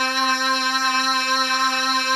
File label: PPG VOX, Ensoniq TS-10; Rubber Chicken Software Sample Bank; TS-10 2